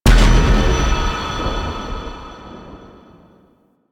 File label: Void Dragon weakpoint destroy; Enemies; Warframe; Duviri